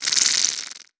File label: poison shoot warning